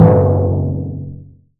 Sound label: Timpani Orchestra MPC; Drums; Misc Percussion